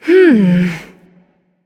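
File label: thinking, female; smite; voicepack; hera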